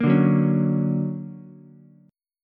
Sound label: D Augmented Major 7th, down, Ukulele; D+M7 strummed; JGuitar